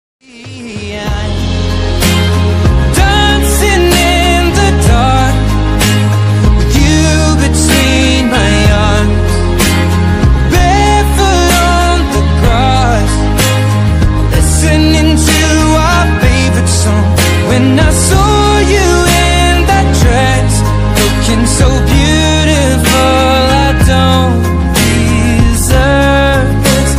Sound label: perfect ringtone